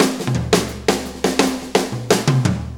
4-4 Fill, Drum Loops; Sonic Foundry (Sony Creative Software); Drum Tools; Fills

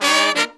Pop & Funk Brass

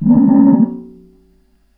Radiator, contact mic, Metalics

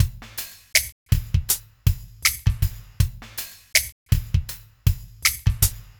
Smooth, R&B Drums

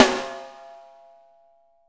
6X12 WOOD; Sampleheads; Peter Erskine Living Drums

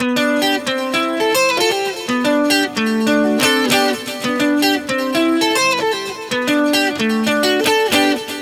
TWNSHIP, S.AFR.GTR; Spectrasonics; Heart of Africa 2

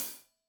Hat Loose, Hi-Hat, Cymbals Stereo

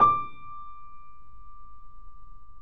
PP D 6 off, Grand Piano off mic; AKAI S6000 CD-ROM - Volume 2

